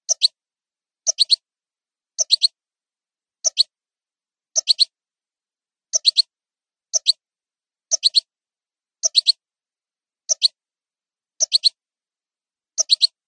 padreos sueltos; campero

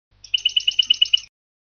cascabeleo golpeado, campero

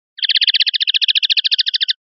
rep torreos, pardillo

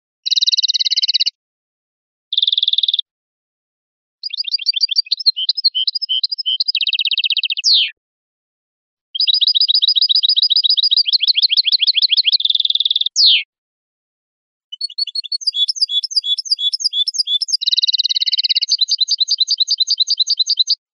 falta rulo

